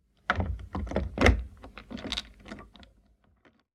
Door Locking

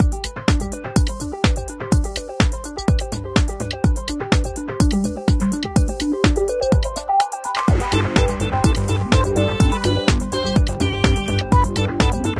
race

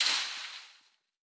Disc Rico Tree; boomerang fu

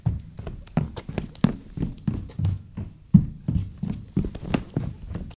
Footsteps

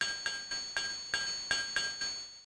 Triangle riff

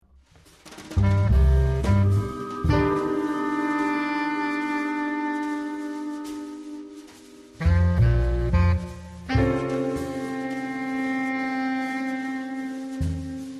Noire Ambience; l.a. noire the lost tracks

